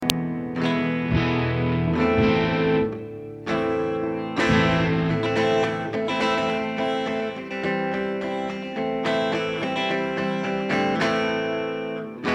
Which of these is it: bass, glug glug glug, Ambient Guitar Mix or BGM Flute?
Ambient Guitar Mix